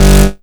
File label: Synth Hit G; Trance; Synth Hits; Zero-G Trance Inducer; 90 s Sample CDs